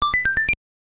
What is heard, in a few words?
1-UP jingle